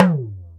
Talking Drum Down; Multisamples; Digital Sound Factory Planet Earth

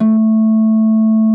A2, SYNTH PLUCK; Music Store - Claudius Diemeter Schwerlos

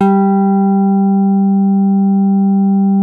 MID G3, synth pluck

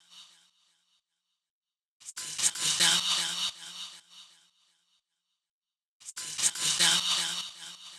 FxVox Loop 120; Equipped Music - Loungin House; Music-Fx Loops

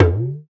Talk Drums

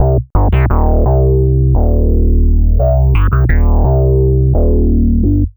Synth Bass

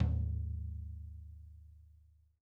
tom, drum; 90s sample CD

